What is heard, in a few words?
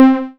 C3, Synth Line; 90 s Sample CDs; NiceBeats; SynthLine VOL-21